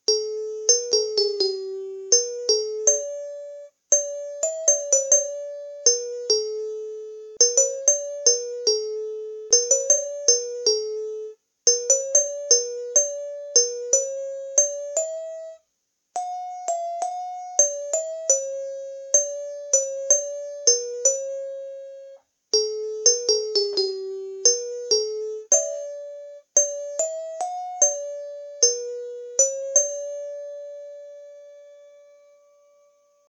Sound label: chimes; chimecollector